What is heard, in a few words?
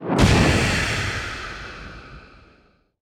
Hero Scream Spell; Hollow Knight